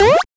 Misc Short FX; Vengeance - Essential Clubsounds Vol.4; Effects

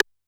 C#2 Analog Side Stick, Yamaha PSR-E323

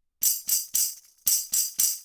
Tambourine; Haze; Sonic Foundry (Sony Creative Software); Indie Rock Anthems; Session 06 - Haze

